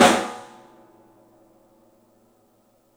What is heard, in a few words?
STEEL, Drums, ROOM SINGLE; 3D Sound